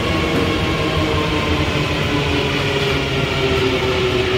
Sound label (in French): freinage, Metro